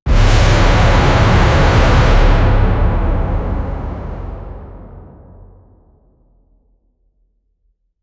shift into earthquake lik; monster

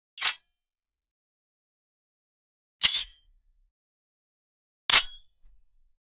sword being swung and hit

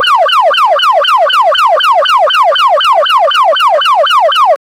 loud siren police like; emergency

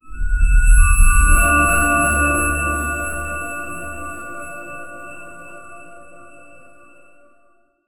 sound of summoning, ambience

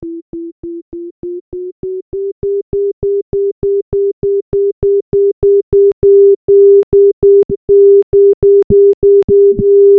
rising eerie tone heartbe, tension

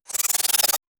a sfx for casting a, fantasy

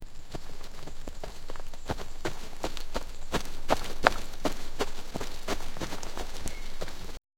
a man limping through a; human movement

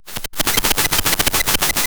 slime keyboard

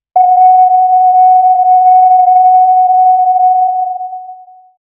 old interphone sound sing

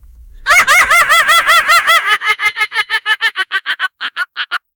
scarecrow laughing

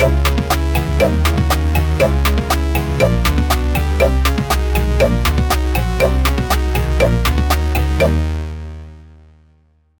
upbeat and cheerful space; cartoon

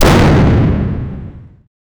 explosion of a ship hit; ships

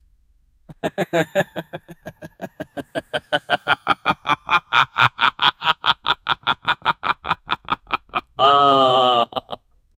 the sound of an evil

